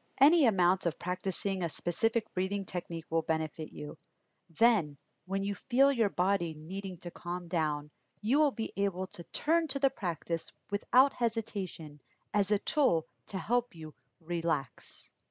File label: Breathing